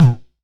Bonk Ball Bounce, Kicks; Airwindows

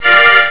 chain choir; Amiga Soundtracker Sample Packs

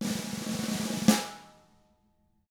snare roll

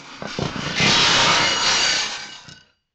THROW; PERCMETALIC

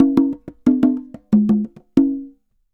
Congas Poly, Festa Latina, Drums & Percussion; Best Service; Festa Latina VOL-1

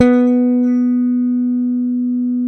SPEC SUSTAIN, Bass

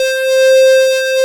SYN, Analog; Sound & Vision; Gigapack I CD 2

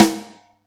Yamaha EX-5

